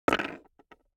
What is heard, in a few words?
DICE on Hard Wood, Throw and Roll, Metal, 2 Two Dice; Samples; ArticulatedSounds; DICE Sfx Party (Extended)